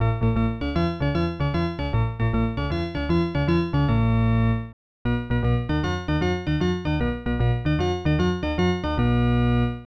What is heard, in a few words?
Organ Music